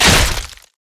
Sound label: basicattack dragon; LoL; shyvana